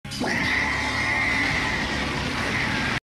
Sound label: Medusa Roar